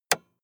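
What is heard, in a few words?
sports car interior switch on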